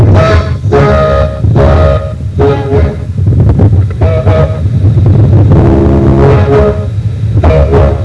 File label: whistle